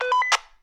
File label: Walkie Talkie Famous Beep